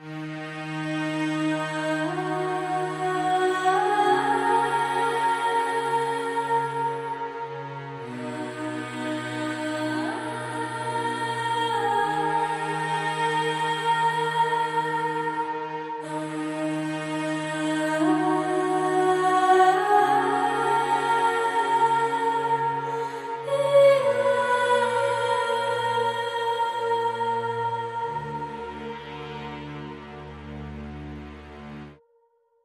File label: Choir and Strings